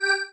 marimba spacesuit; ゆめ2っき

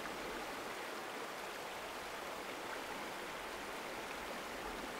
water

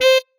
marimba bat

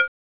Saw E3

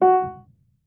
marimba piano; ゆめ2っき

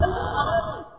hurt

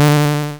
error; ゆめ2っき

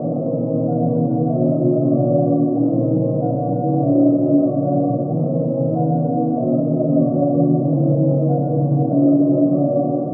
choral; ゆめ2っき

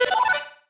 marimba dice; ゆめ2っき

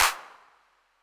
marimba; ゆめ2っき

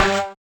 marimba steeldrum; ゆめ2っき

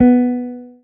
marimba guitar; ゆめ2っき

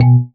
marimba raincoat; ゆめ2っき

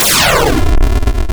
chase

